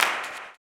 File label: CLAP, blues & soul; ILIO; Vocal Planet